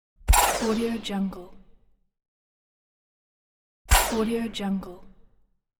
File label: futuristic weapon shoot